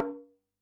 TABLA, PERC

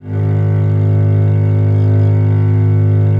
VL G#0, VIOLE, Historical Instruments; USB Soundscan vol.23

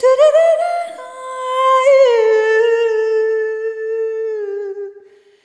Arrhythmic F; Sonic Foundry (Sony Creative Software); ILONA

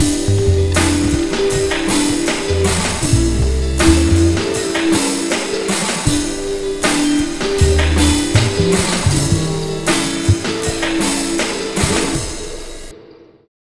Slo Mo Full Wak, Lounge; Zero-G Kocktail Kollection; Construction Kitz (Lounge)

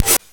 Breath, Jomox Xbase-09; Drums

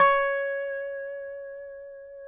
DOBSUSDB5 -R, dobro notes; Country

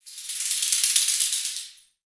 toy, metal jangle, perc fx; Roland LCDP14 Africa VOL-2

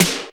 SD, dance drums hip

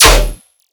bass oneshot gun D

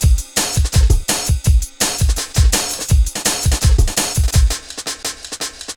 Programmed Stepz, Jungle Drum Loops

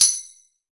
BRASS TAMB; E-MU Formula 4000 Series Vol. 5; Protozoa; Proteus2 Instrmt

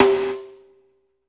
HIT VERB, PROC SNRS, Drumworks

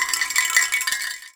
Finger Rattle; Battery 3 Sample Kits

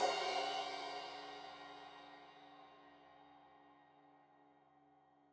Crash 15in L edge, Cymbals Stereo

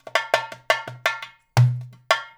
FILL, Percussion

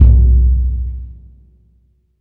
Burundi Drms; Roland LCDP14 Africa VOL-2

